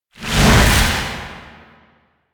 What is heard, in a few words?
Incarnon Slide Swing; Warframe; Weapons; Duviri; Incarnon Adapters; Melee